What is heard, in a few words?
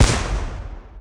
balls destroyed; 祖玛